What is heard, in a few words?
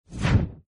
character select; star-wars-rebels-team-tactis